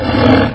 salamander damage

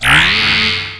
attack; apocalips h

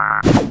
ice straw attack

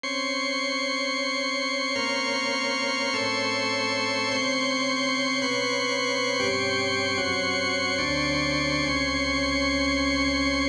organ

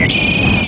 icewind attack

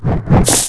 punch bug attack

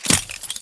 freezer move

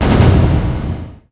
feint bomb

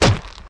icebear die

